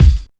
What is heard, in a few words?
Alesis DM5 Kick